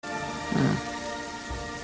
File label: ahh; nanny mcphee